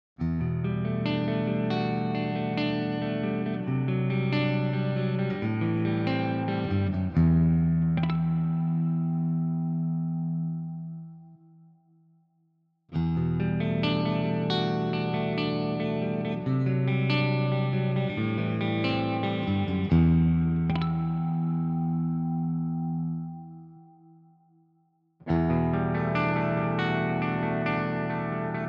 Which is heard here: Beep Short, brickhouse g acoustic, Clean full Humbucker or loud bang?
Clean full Humbucker